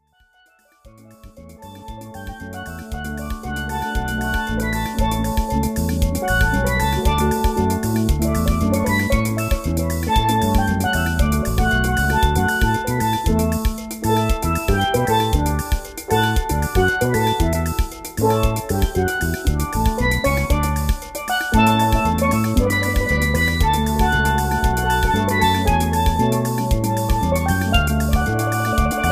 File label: steel drums duo